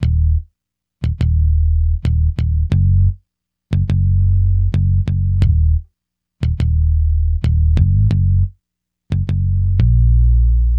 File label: Hip Hop and R&B Drumloops